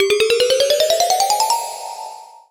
Jingle, Hat Rolling Around; streetpass mii plaza; 3ds

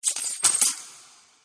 Collect Gold

